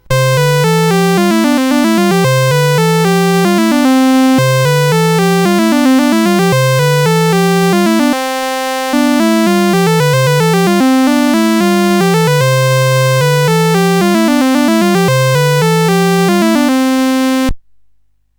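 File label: Number Tumbler, MOS 8580 SID chip music, games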